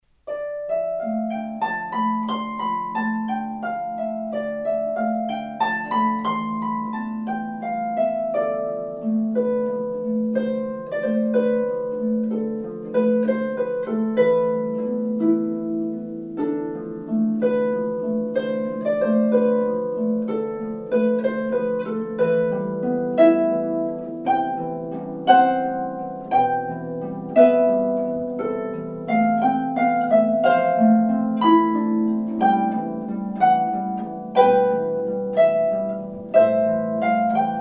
Four Miniatures for Harp, Moonlight Trance

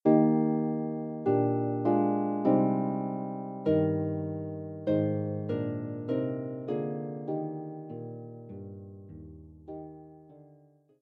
Harp

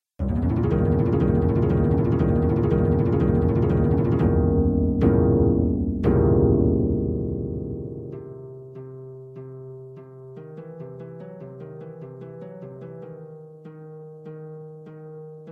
solo lever or pedal harp; gallop

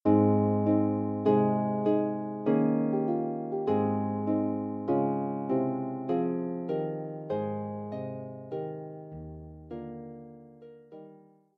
Harp

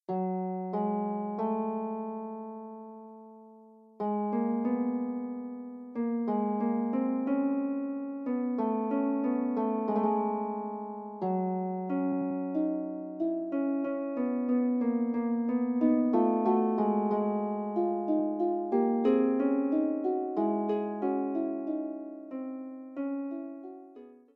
Fugue, Harp; Forty Eight Preludes and Fugues Book 1; prelude and fugue no 14 in f sharp minor book 1

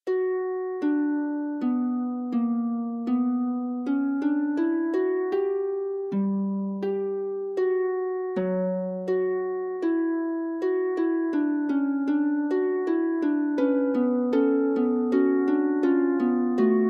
Fugue, harp; Forty Eight Preludes and Fugues Book 2; prelude and fugue no 24 in b minor book 2

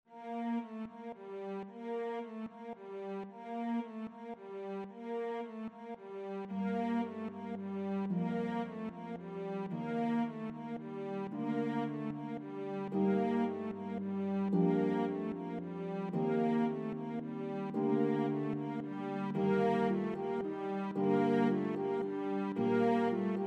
Cello and Pedal Harp

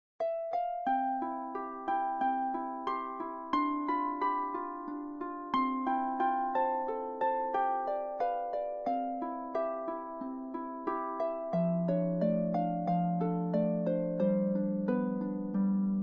Harp

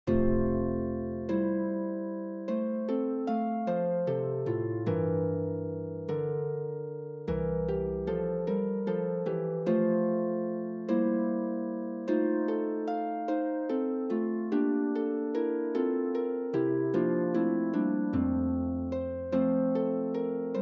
Popular Folk Songs for the Harp; Oh Dear what can the matter be

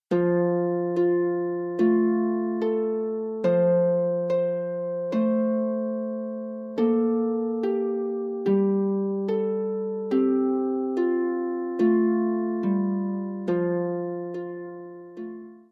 Harp; Tudfuls Harp; Dau Ci Bach; Two Puppies

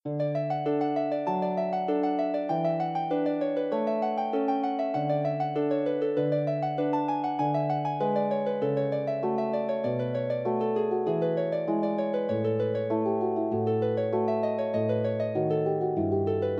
prelude, Harp

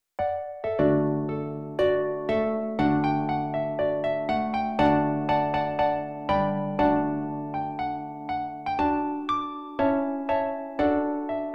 Harp Ensemble, Rondeau; Familiar Classics for Harp Ensemble

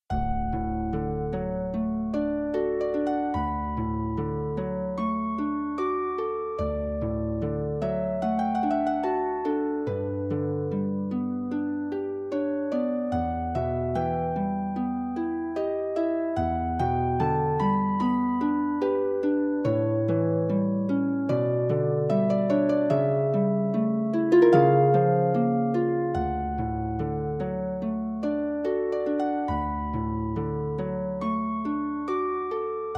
Famous Music for the Harp

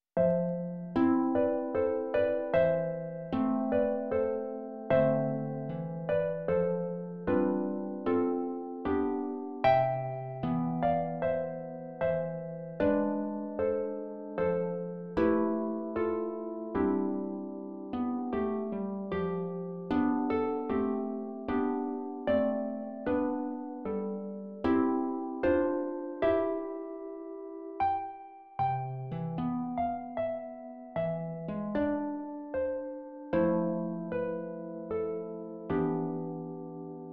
Carols for Solo Harp

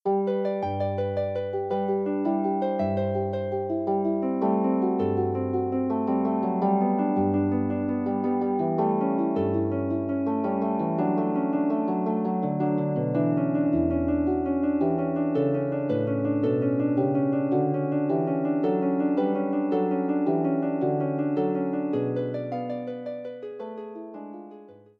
prelude, Harp

